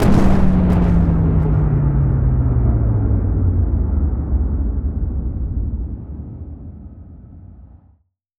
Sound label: wing explode; voidwing